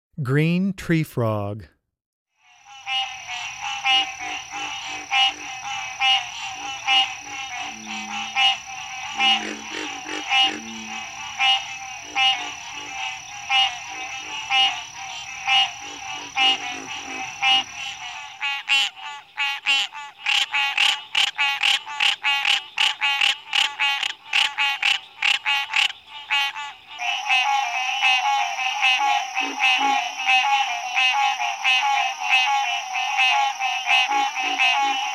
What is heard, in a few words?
Green Treefrog